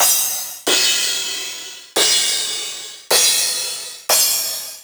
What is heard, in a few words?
909 mod CRASH CYMBAL; Sliced